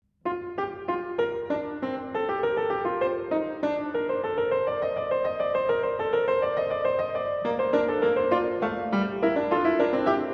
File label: Fugue in B-Flat Major; Prelude & Fugue in B-Flat Major, BWV 866